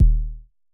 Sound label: Basic Kick D#1, Dirty Kicks, Vermona Kick Lancet; LookIMadeAThing Kicks